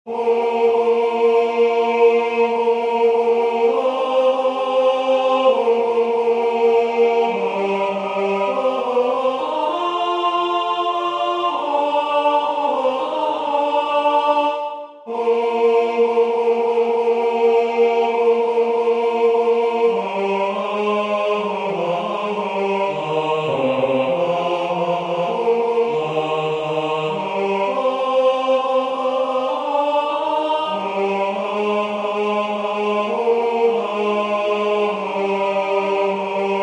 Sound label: Hymne à la nuit Tenor; Concert du 13 décembre 2024 en l'Église St Pierre - St Paul